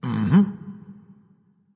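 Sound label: nonverbal agree; smite; voicepack; ullr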